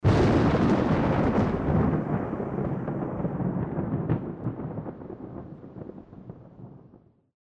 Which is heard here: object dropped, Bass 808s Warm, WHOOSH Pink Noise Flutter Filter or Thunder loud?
Thunder loud